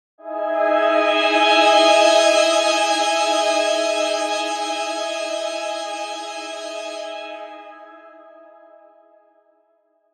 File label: Pad